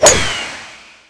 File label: Swing Tee; pangya fantasy golf pc